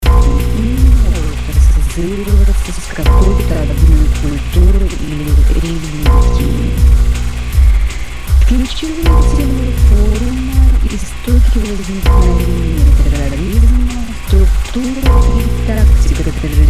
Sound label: Guitar One Note W Beat